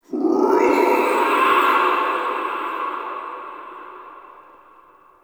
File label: Interior Texture Painful Screech, Infested, Ambience; Misc Indoor